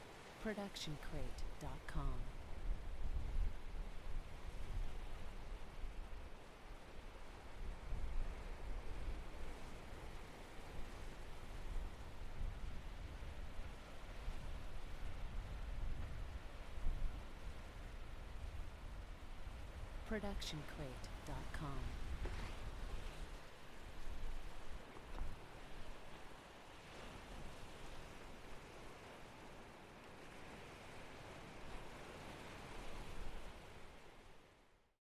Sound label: loopable waves on beach windy; soundscrate